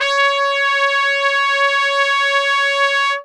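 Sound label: C#3 TRP STR, Brass trumpet straight; Best Service; Brass Super Section; TRP STRGHT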